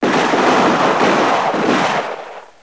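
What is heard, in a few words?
rifle shot; dragoon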